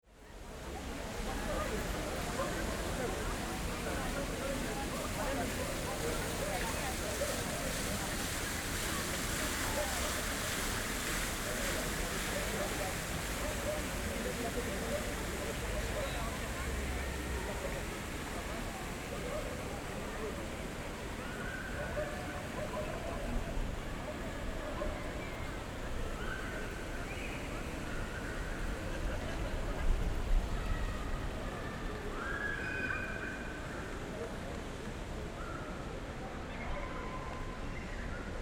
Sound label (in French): lyon palais st pierre